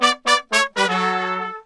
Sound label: 120, BRASS SAMBA; Best Service ProSamples vol.14; AKAI